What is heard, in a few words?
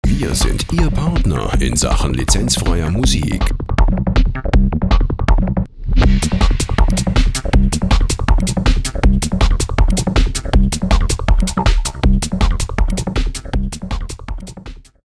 rhythm